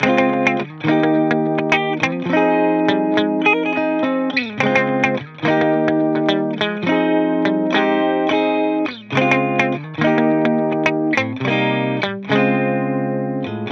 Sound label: guitar loop 105 Fm; Pop; Guitar Stacks; SD PASADENA Pop Sample Pack; Slate